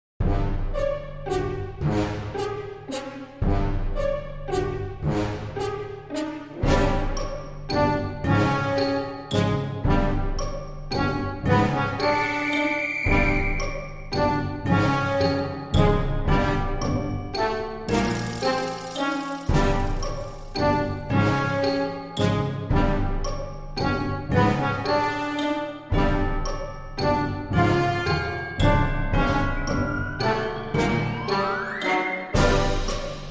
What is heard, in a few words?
Drunken Elephants March